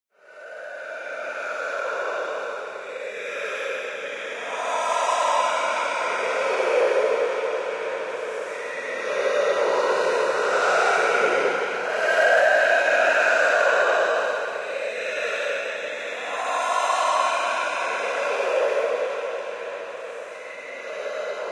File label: crowd Chant Right, football